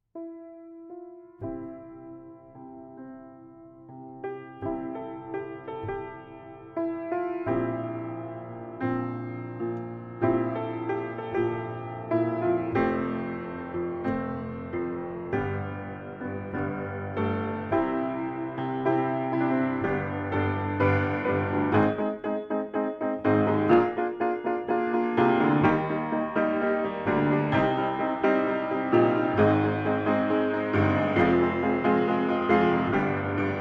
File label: Instrumental; theater